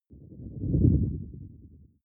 whoosh storm wobble short; swooshes-swishes

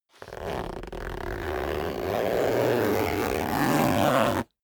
zipper down jacket close slow; objekte; reissverschluss

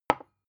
glass put down; objekte

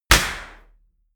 clap, percussion

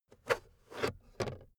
car backseat table pull out cupholder slow bounce

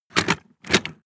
movement metal storage medium, box; objekte

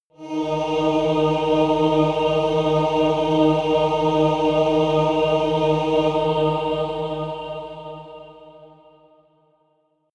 demon choir one note high

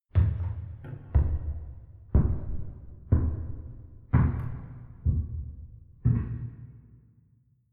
step church wooden stairs slow; menschen; schritte

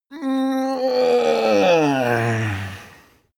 male moan stretch; soundpool

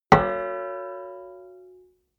plate hit ring stopped; hits impacts

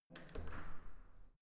entrance door open normal

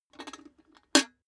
cash box metal put down handle; maschinen; soundpool

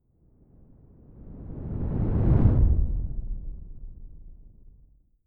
whoosh simple low long grainy; swooshes-swishes

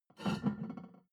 plate on plate take soft, restaurant cafe; sport-freizeit

